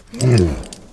Horse; anemoi

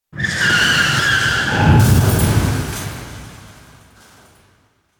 bike crash reverb; anemoi

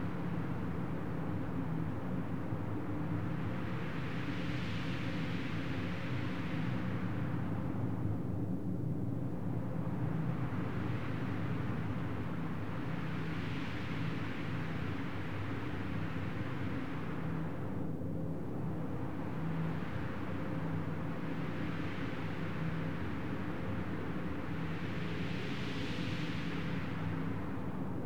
indoor wind corridor; anemoi

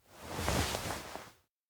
hug; anemoi

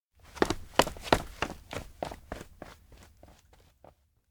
steps indoor town hall stairs pata reception; anemoi

